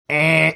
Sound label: making buzzer sound